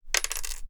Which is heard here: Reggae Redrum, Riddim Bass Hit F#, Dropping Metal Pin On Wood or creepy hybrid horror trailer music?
Dropping Metal Pin On Wood